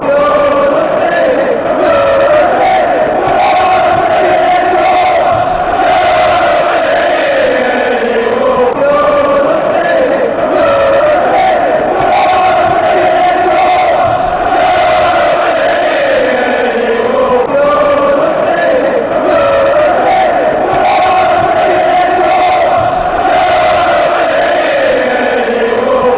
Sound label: youre not singing any more, fanchants